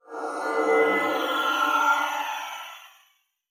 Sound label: Teleporter Abduction Fail; Evolve; Wraith